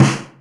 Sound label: perfect steel snare drum c key, Royality free acoustic snare sound tuned to the C note